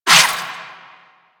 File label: Shadow Melee Swipe; Warframe; Duviri